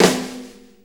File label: 6-8 Snare Flam, Drum Loops; Sonic Foundry (Sony Creative Software); Loops & Samples Drum Tools; Flams